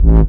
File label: hardcore, BASS, Techno Trance; Techno Trance Essentials